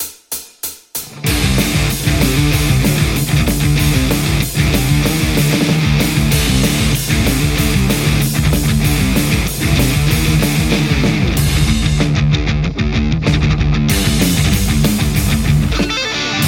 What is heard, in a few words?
metal Les Paul JCM800 hot; mercuriall